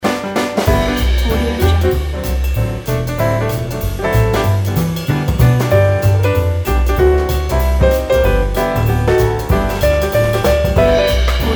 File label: happy birthday lively jazz loops